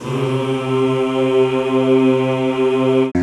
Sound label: CHO C2; Sound & Vision; Gigapack I CD 2; CHO Zzzz + Vvvv; CHO Vvvvv